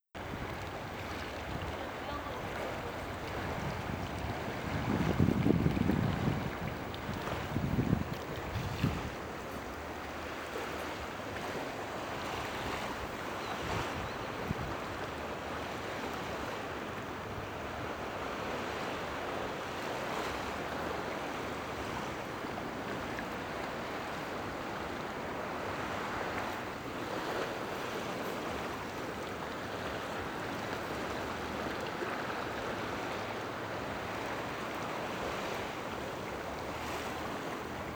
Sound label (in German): Parasporos Beach (Zikaden); Greece; Club; Original Video Ton; Fieldrecordings